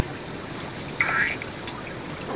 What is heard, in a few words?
Oncorhynchus tshawytscha Air Movement, Recording contains multiple sounds